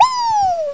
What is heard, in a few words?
wee